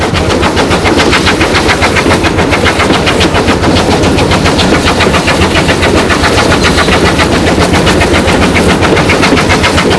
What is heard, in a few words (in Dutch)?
Blanc stoom